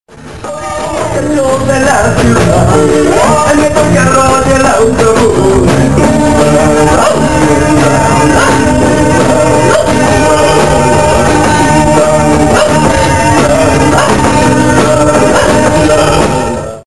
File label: cosmo rock